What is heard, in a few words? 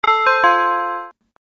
gong; rozklad; zapowiedzi